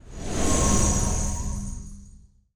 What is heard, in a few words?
gui clear cards; ChestOpening